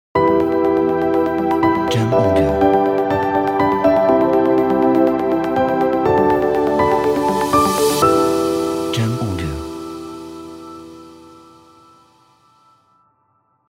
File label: Inspiration Corporate Logo